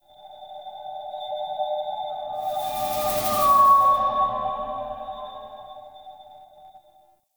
Root Cave Psychedelic Moan Texture B; Ambience; One Offs; Neathuns Root Cave

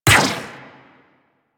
Drone Laser Fire; Artificer Drone; Super Moa Drone

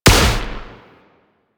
Shot; DE; Warframe; Weapons; Archwing; Arch Anti Aircraft Gun; Kuva Grattler

